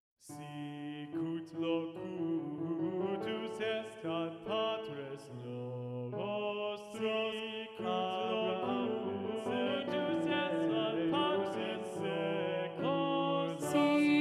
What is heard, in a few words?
Sicut locutus est SATB, Alto Predominant